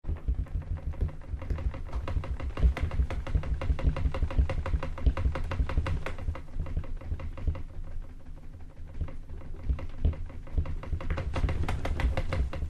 Rattles Wood Dresse, wood stress

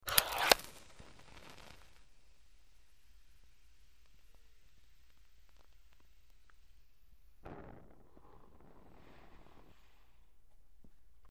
Sizzle Match Strike; fire

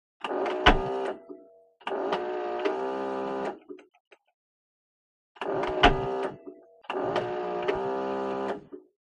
Electric Motor Small

